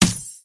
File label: fur tree attack contact bottom